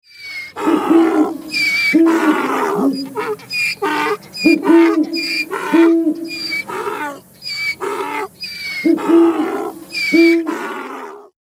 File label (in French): duo ane hibou; loto sonore; loto des animaux